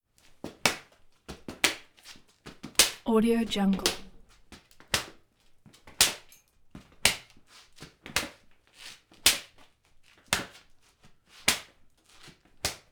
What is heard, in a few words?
foley leather whipping cement with leather belt; شلاق با کمربند چرمی